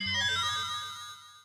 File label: magical